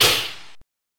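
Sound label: Airlock Door Open Quick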